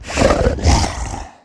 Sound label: move; player